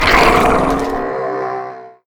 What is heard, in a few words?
attack; svencoop; morass beta; rtrooper